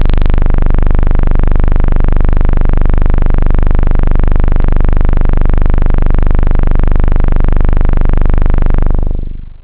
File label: dumpf, synth bass